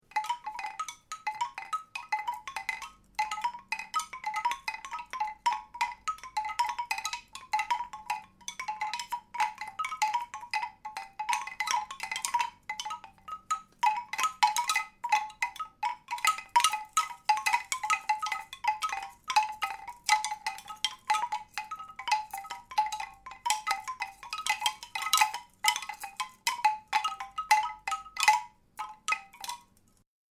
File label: Wooden Wind Chimes, Clinking and clacking of wooden windchimes / wood chimes; Chime Sound Effects